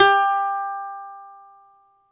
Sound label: BALALAIKA, Prima Balalaika, Plucked Strings